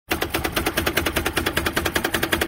help what noise car sound, 4th generation maxima 1995-1999